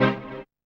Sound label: HOUSE, House Dance